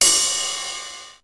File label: RIDE, Classic drum machines; 909; musicradar-synth-samples